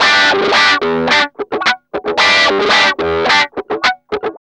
GUI, LICKS; 110 LICKS

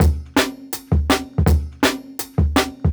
Jungle Breaks

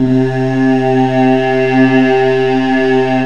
OOH VOX S

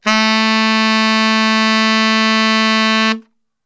A 1X SAX STR, SAX STRGHT; Best Service; Brass Super Section

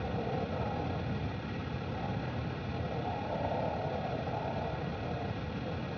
Ambience Loop, outdoor

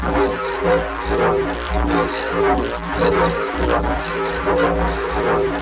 FUZZ RIDOO; Distored Reality

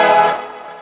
DR. LOOP; X-Static Goldmine 3; CHORDS

